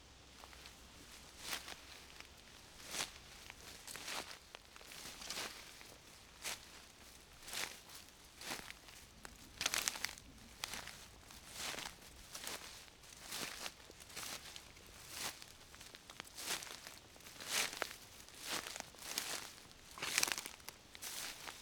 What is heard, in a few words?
Steps Over Forest Vegetation